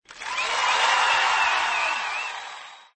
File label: cheer; nickelodeon basketball stars 2015